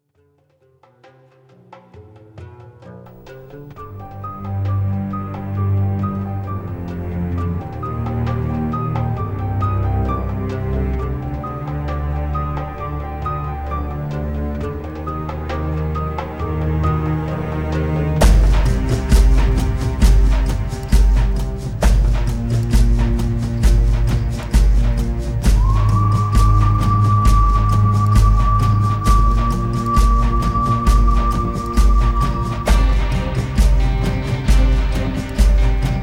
电影音乐; 奥斯卡电影音乐

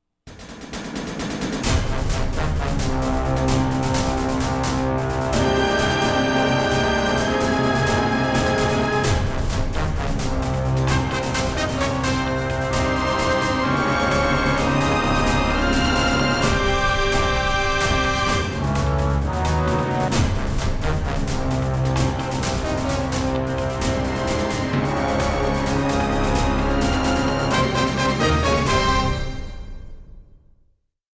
激情的背景音乐

